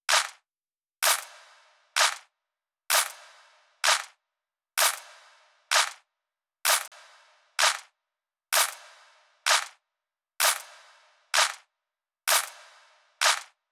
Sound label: Drum Loop 128 BPM Clap; VEC5 Multi Drum Loop 50